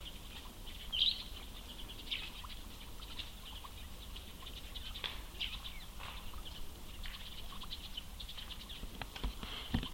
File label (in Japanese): suzume; akasaka